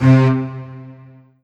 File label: Korg TR Rack Marcato Strings C3